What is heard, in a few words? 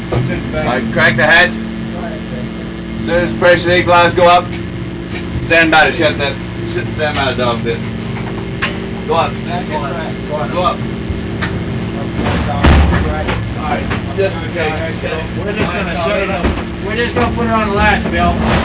surface in rough seas - crack and open the hatch - water comes in; Surfacing in rough seas - Crack the hatch - Close it quick!; Caiman